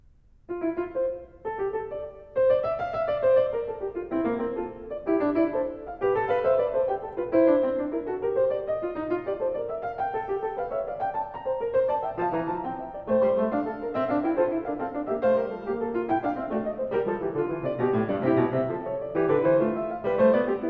Prelude And Fugue No 11 In F Major BWV 880 Fuga, Well-Tempered Clavier; Johann Sebastian Bach; JS Bach